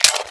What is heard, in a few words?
mg42 cover up; weapons